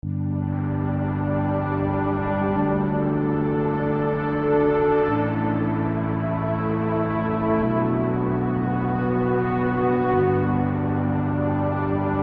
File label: New age Relaxing Commercial